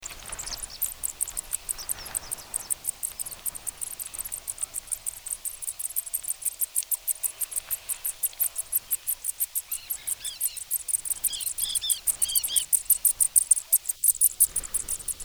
Brandt's vole Lasiopodomys brandtii, Steppe Mongolia